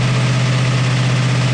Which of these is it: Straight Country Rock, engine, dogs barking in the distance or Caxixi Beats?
engine